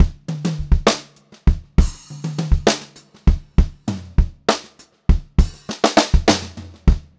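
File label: variation, pop-rock drumloops; USB Soundscan vol.37; AKAI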